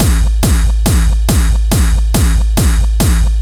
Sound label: Killah, hard style